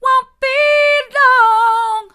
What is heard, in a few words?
wont be long hard, vocal